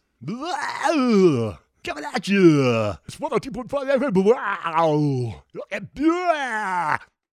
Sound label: Wuahhhhaauh; NEWSHOUR